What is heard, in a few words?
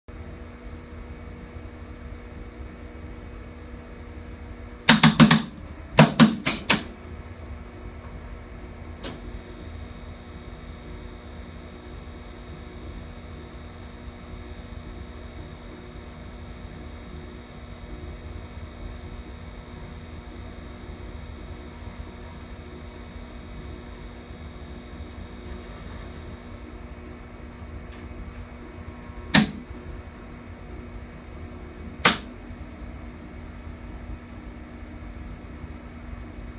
otis gen 2 controller noises, elevator; otis elevator company